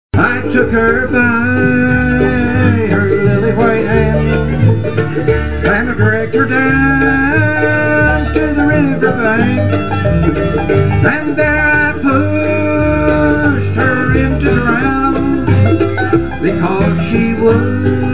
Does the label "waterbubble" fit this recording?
No